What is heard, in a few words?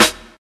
bright snare sample f key, snare tuned to the F note, Loudest frequency: 3204Hz; snare drum samples